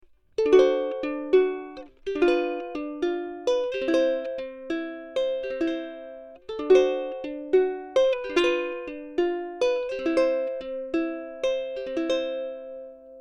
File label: uku